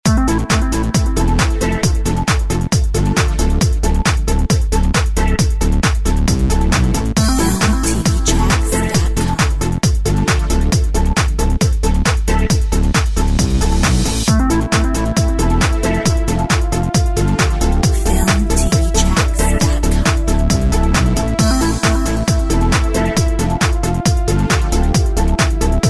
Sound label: Techno waves